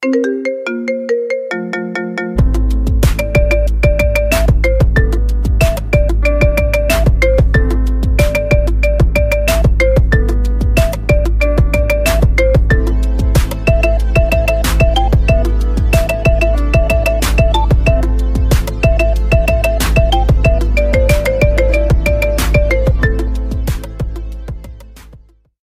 ringtones marimba

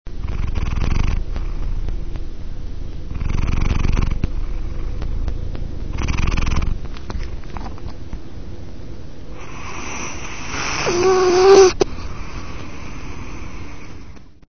cat purr

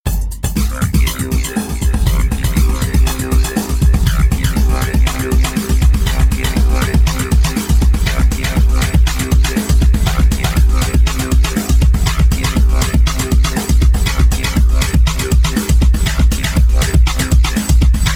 funky ringtones music

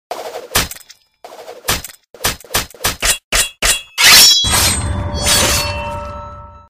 ninja fight

